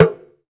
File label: Talk Drums, African Contemporary